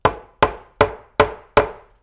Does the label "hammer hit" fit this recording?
Yes